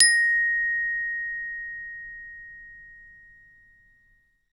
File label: BEL ORCH